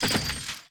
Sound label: reel nudge; netent